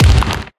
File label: kill